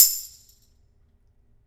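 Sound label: Tambourine, Lounge Single Drum Hitz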